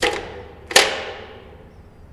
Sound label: Jail Door Latch Clos